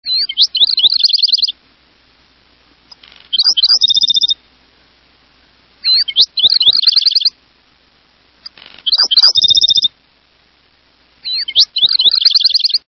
Black throated Sparrow

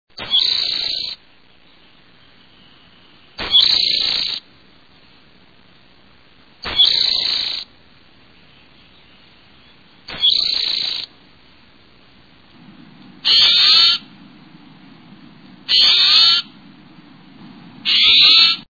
Spotted Towhee

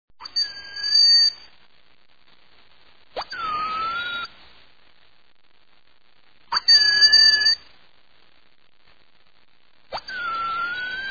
Broad winged Hawk

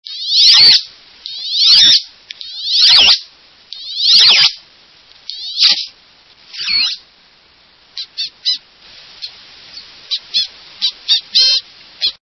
Wood Duck

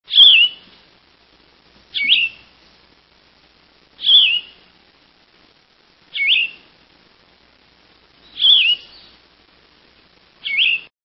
Yellow throated Vireo

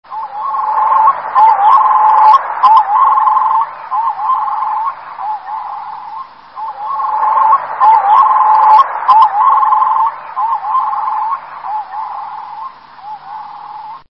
Red throated Loon

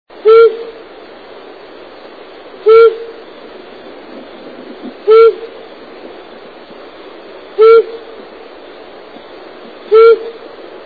Flammulated Owl, songs

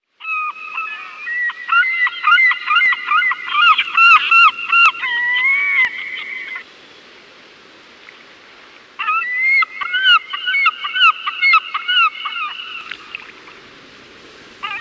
Iceland Gull

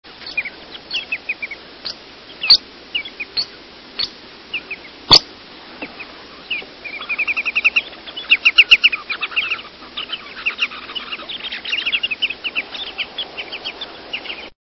White rumped Sandpiper

